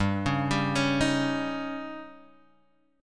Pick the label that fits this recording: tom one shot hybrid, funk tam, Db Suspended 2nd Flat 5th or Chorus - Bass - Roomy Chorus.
Db Suspended 2nd Flat 5th